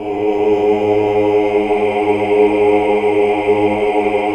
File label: AAH UNI